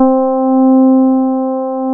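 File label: Soft EP E5, electric piano; SoundFont - The Developer's Choice - Volume 1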